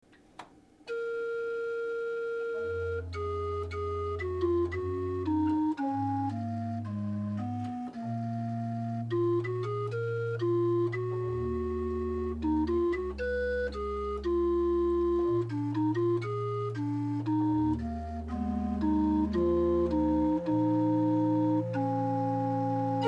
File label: psalm, huisorgel; psalm 112